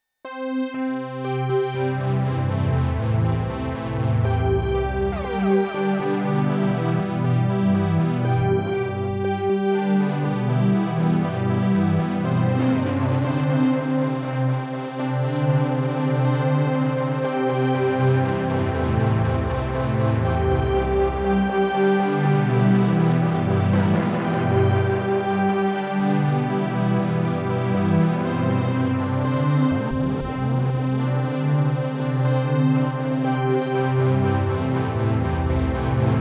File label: NTS-3 Synth Tape Echo